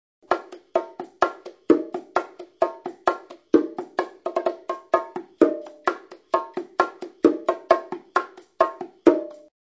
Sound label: bongos